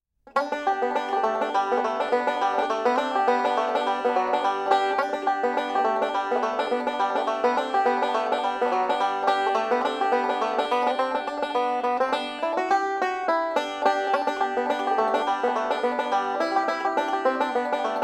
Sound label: Deck The Halls, banjo